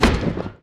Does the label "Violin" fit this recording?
No